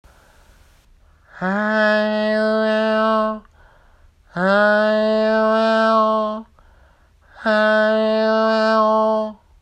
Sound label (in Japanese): オープンハイ 男性